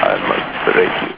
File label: break